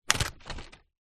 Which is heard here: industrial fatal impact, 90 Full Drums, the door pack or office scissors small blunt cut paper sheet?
office scissors small blunt cut paper sheet